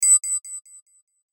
CHIME BRIGHT STUTTER; Multimedia; Chimes; Blastwave

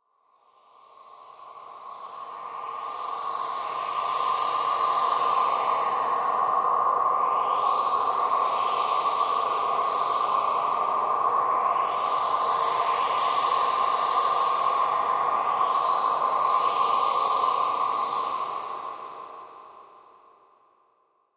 Sci Fi Wind Gusts High Texture Synthesized; Blastwave

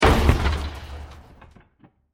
Wood Impact With Various Elements; Blasts; Impacts; Crafted Signals

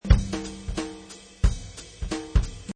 Jazz drum loop at 180 bpm.; Drum Loops

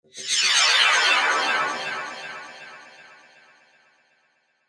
Magical Shimmer, magic sound effect

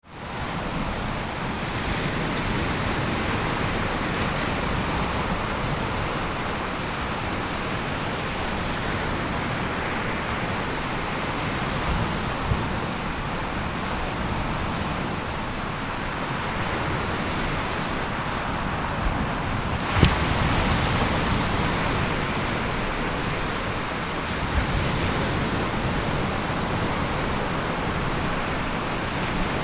Ocean Sea at Long Shot; Nature

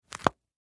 Police Badge Close Flip Leather Wallet; Flicks; SFX Bible

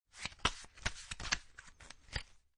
Cards Playing Cards Shuffling; Playing cards, poker, shuffle. Shuffling cards.; Lynne Music

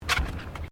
Footsteps Bare feet Wooden Deck Step; Footstep Foley; Human

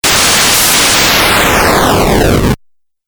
Fireball Video Game

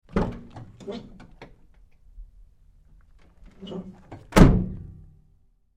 Truck GMC Savana Door Side Open-Close Med

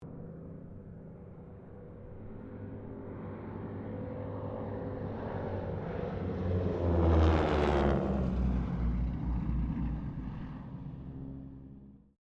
Harvard squadron 4 pass, stereo; Mixed aircraft squadrons; Airplanes; Vehicles

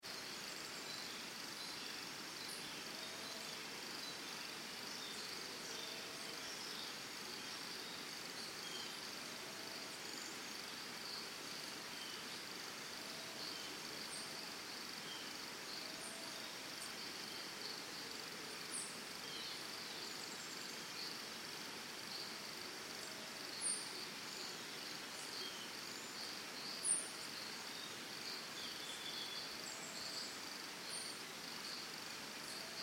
Birds Crickets Costa Rica Early Morning; Backgrounds; Forests and Jungles; Big Room Sound

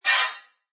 Blast Metallic Scrape Whoosh; Scifi Production Elements, Set 2

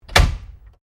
Door Pivot Interior Wardrobe Louverd Close

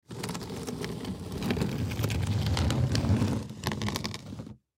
Crate Slide Wood, Crate Slide Box Drag On Cement; Crate Slide Box Drag On Cement Slow Long; Slide Sound Effects; Foley; Blastwave

